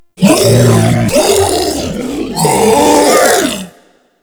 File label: Infested Ancient Toxic Tentacle Swing Scream